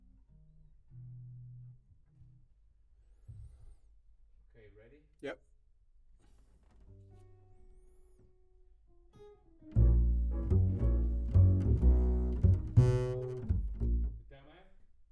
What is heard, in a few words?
Bass